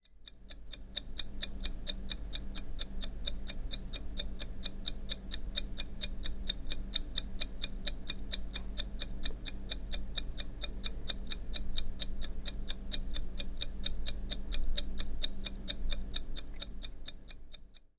tick

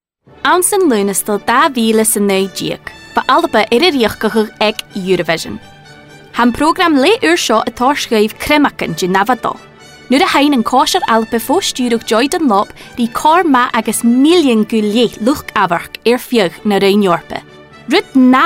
Gaelic Reel

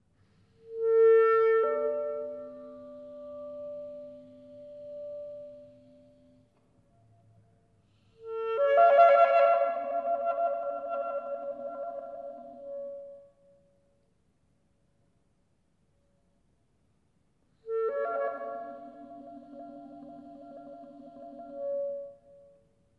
Reveille at Night

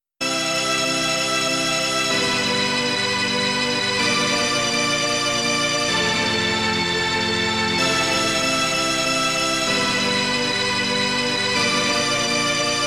Synth

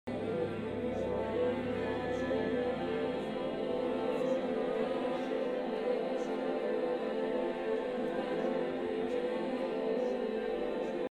Clocks And Clouds; Ligeti

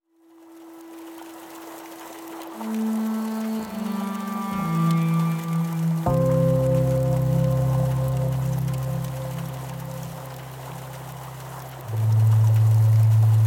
Skysounds

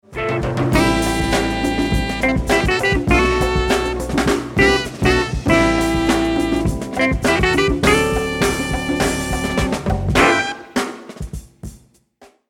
Drums & guitar; Funky Dope Madness; Drums #3

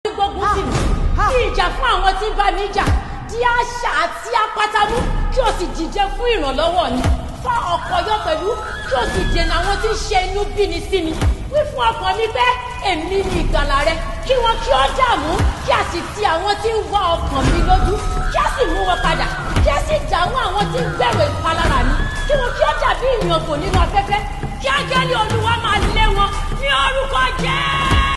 gospel incantation

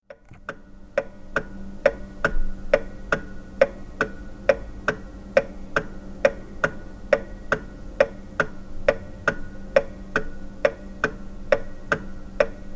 tick

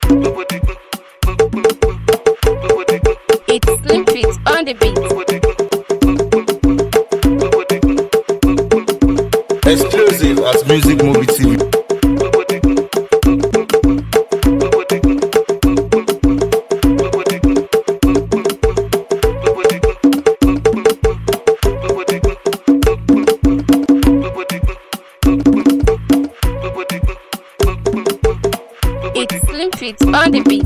Drum Beat

